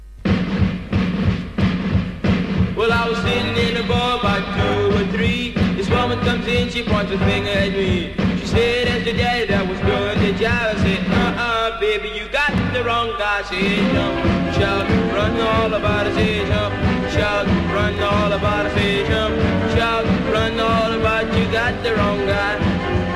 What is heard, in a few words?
guitar